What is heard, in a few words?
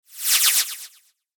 Whoosh Squeaks High Fast; Blastwave